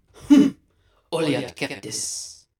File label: Engine Room; Gs Engine Room 03